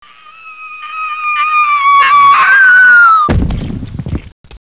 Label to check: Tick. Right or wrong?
Wrong